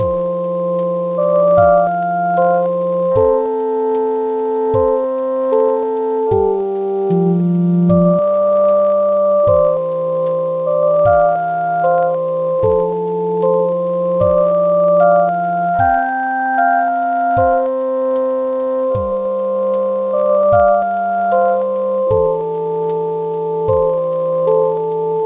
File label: lofi